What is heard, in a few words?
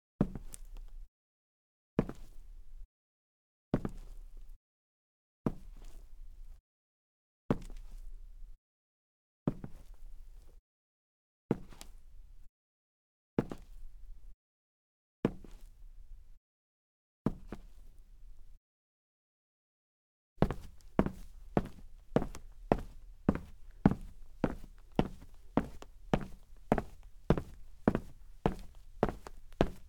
Wood Parquet Sneakers, Foley Footsteps; Sound Ideas